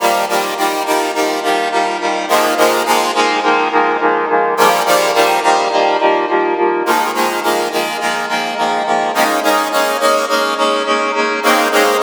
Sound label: Freeze WAVETABLE